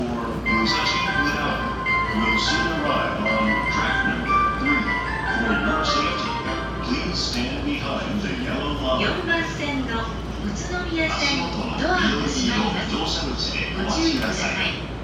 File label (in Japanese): 湘南新宿線 新宿 北, train sounds